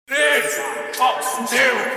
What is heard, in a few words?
low echo